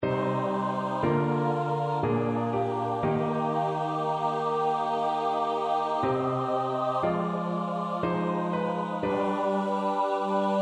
come sweet death, choir; bach

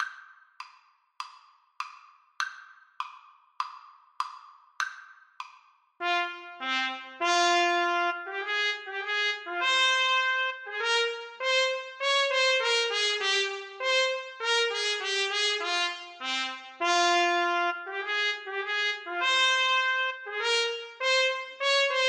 trumpet duet

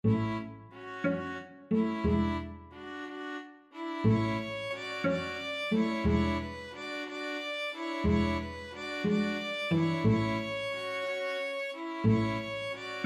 barcarolle, string quintet

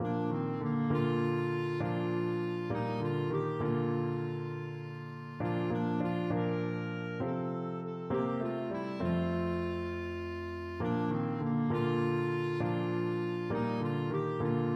blessed assurance, clarinet

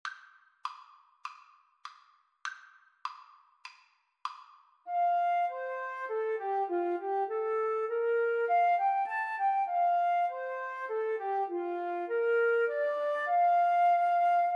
Flute Duet

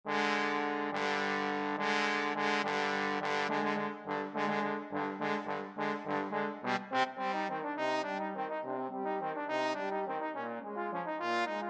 trombone duet

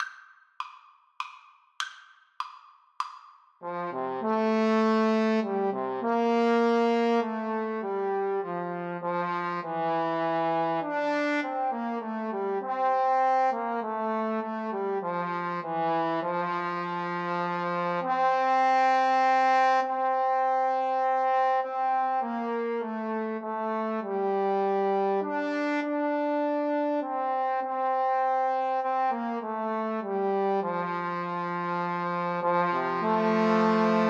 Trombone trio

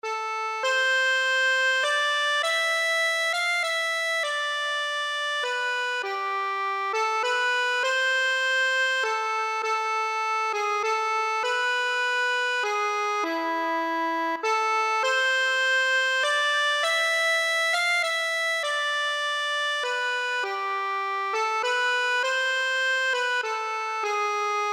greensleeves, accordion

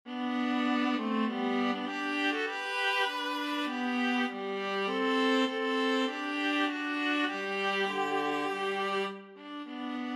VLA, viola duet; battle hymn

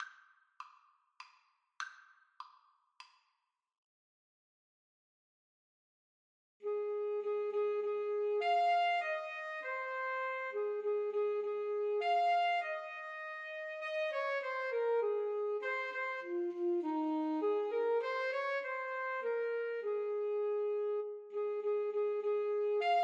sax tenor sax duet

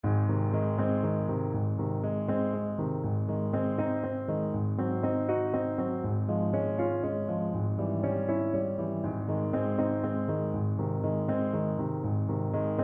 ave maria Tuba

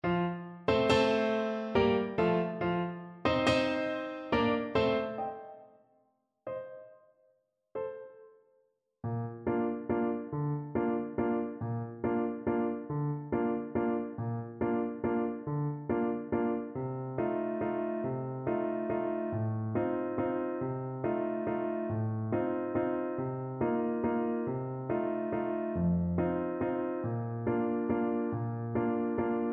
viennese blood waltz, trumpet

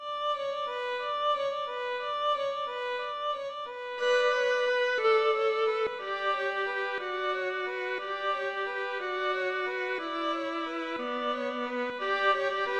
violin viola duet

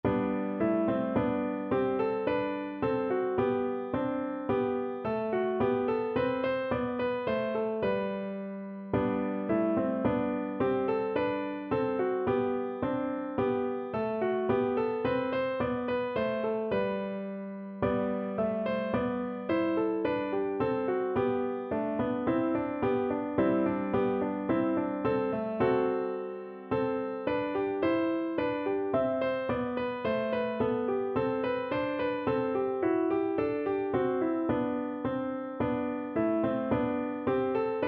musette, piano; bach; Eng Suite3

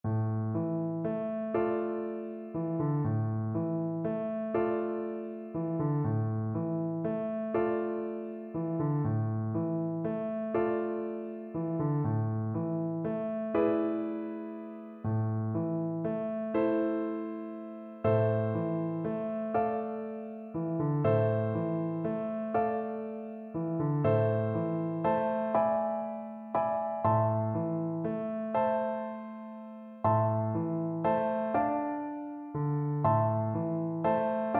hashivenu FL kar, Flute

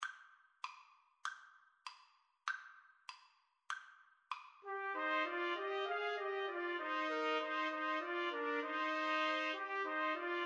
huron carol, Trumpet Trio

